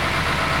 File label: diesel low; rigs of rods